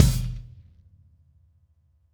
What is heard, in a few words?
Beat Ending; Sonic Foundry (Sony Creative Software); Indie Rock Anthems